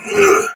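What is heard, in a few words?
Exertion Soldier Sword Agent Male, Battle; Dialog; Barks; Melee; Sinecure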